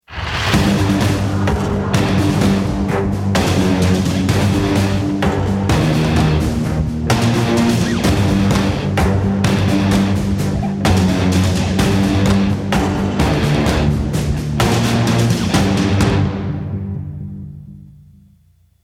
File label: start action